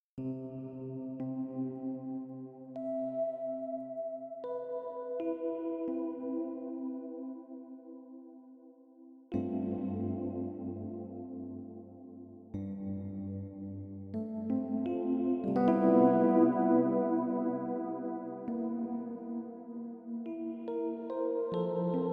Vintage piano pad

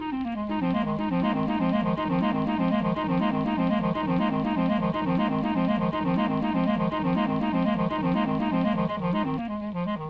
Clarinet Loop

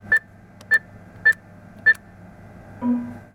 Atm Buttons

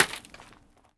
Gravel Impact

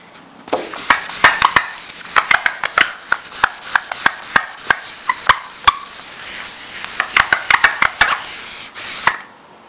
Erase Whiteboard

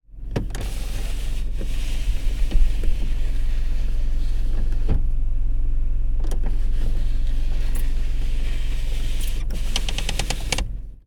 Window

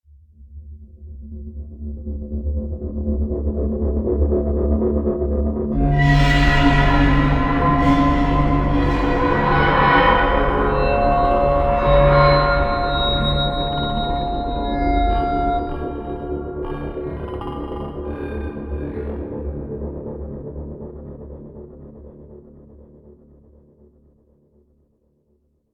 Horror Creepy Tension Teaser

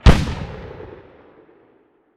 Explosion Outdoors Medium

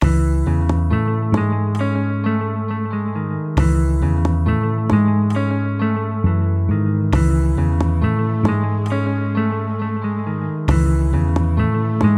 Eerie Ambient Remix

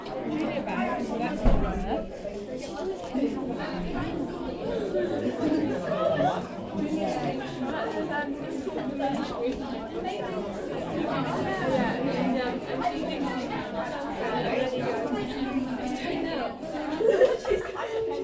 Crowd Of Parents Before School Orchestra Recital

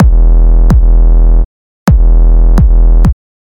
Dirty Kick

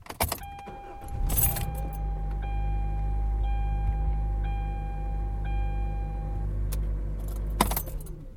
Car Starting

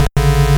8 Bit Wrong Sound

